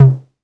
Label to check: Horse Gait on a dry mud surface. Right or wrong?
Wrong